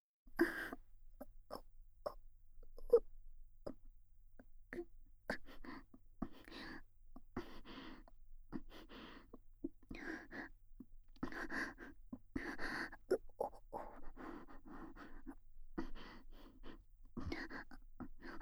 甘オホえっちオンリートラック, ASMR; 密着添い寝

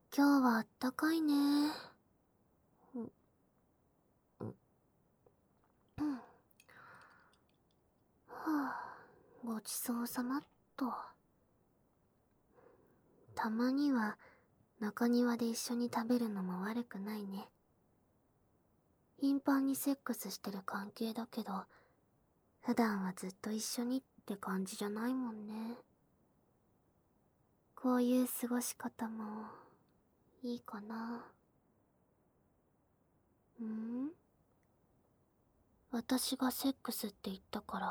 学校の中庭で耳舐め手コキ

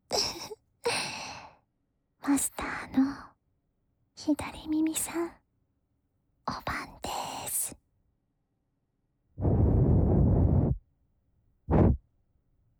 白樺耳かき+羊毛梵天で、ひたすらゆったり癒やしの左耳掃除; CV.田中理恵

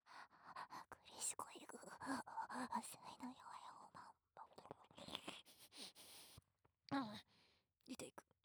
ベロベロべろ; NGテイク; ツン×ヤン デレ実妹おまんこ; ツンデレな我が家の妹はメンドイが可愛い～あれ?なんか様子がおかしい…うぇええええええっ⁉愛重いぃぃいいいいいいっ⁉～

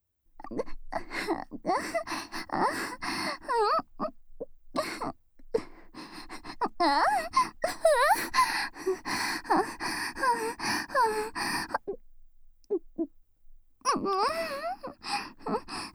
W耳元密着喘ぎループトラック, 効果音なし, ASMR; お姉さん; 一人で両耳責めしてくるプロ級耳"奥"舐めマスターなお姉さんにお耳の奥の奥までほじほじグポグポされる話; "濡れ"耳かき×耳"奥"舐め

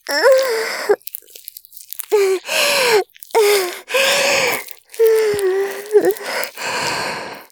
絶頂, ASMR; おまけ(絶頂+潮吹き+中出し ランダム再生)

